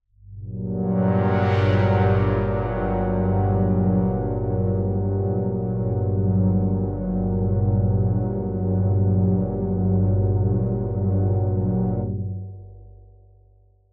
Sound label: One Shot Drone G, dystopian drone; One-Shot Drones